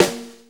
acoustic snare sample a sharp key; Mono

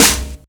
sizzly snare drum c key

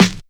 original acoustic snare sample f sharp key

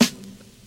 Juicy Acoustic Snare Sample A#

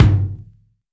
long tail reverb steel kick drum F sharp key

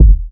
phat kickdrum g key

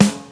tight lows acoustic snare sample g sharp; Mono; snare drum samples

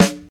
hip hop acoustic snare sample f sharp key

